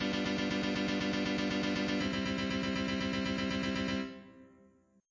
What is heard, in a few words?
chord